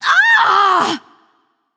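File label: ouch, female; smite; voicepack; hera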